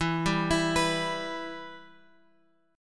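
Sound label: E-Minor, Em Chord strum; Em Chord {X 7 5 X 5 7} arpeggiated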